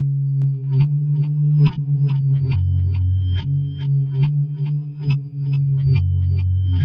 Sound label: bell reverse 140 Bm